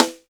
snappy snare sample e key, Royality free snare single hit tuned to the E note